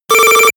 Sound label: notification bleep